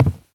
wood step; Golden Crayon Sounds

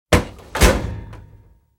locker take item; Original TK Sounds

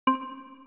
scroll for more, Old TalkBack Sounds

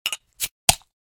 open beer; Original TK Sounds

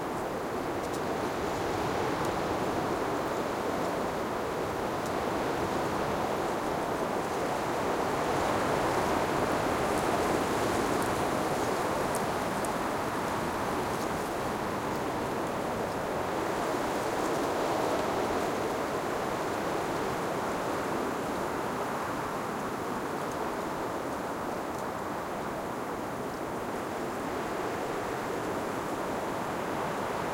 wind; Golden Crayon Sounds

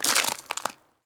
ice step; Golden Crayon Sounds

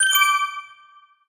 gift on; Original TK Sounds

